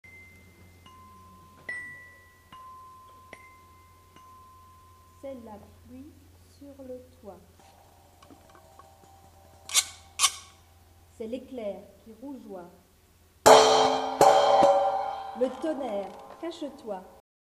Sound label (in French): Comptine sonorisée La pluie